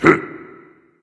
grunt jump dragon; fafnir

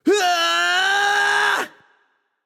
battlecry; smite; tsukuyomi

